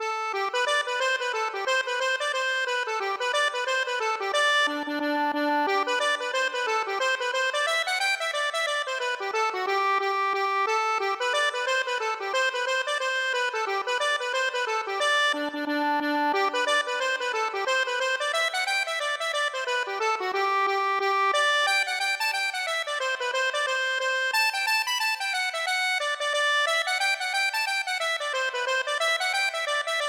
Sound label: accordion